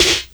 SD HOU, Dance Mega Drums; Best Service

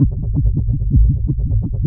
Noise, LFO & Noise

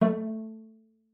cello pizz A3; 90 s Sample CDs; Xsample VOL-5

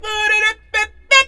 FEM, Hip Hop

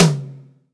STD. TOM, DRY KIT; AKAI S-Series CD-ROM Sound Library VOL-1; DRYKIT1

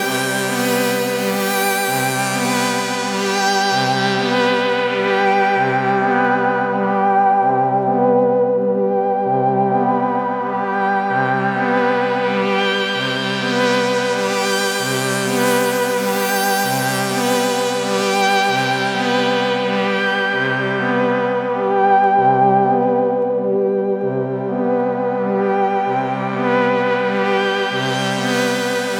NRG Sweep, Trance; Sonic Foundry (Sony Creative Software); Trance NRG VOL-1; Sweeps

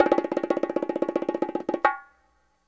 LO BG ROLL, LATIN PC; Best Service Advanced Media Trax Modern Composer

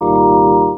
talk box chord oo

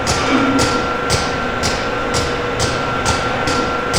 HYDRAULIC, Industrial; SFX

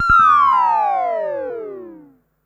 Perc Synth Moog Delay; sci-fi

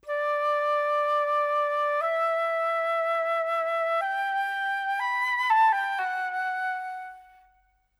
Flute; Sonic Foundry (Sony Creative Software); Orchestral VOL-2; Modern 01

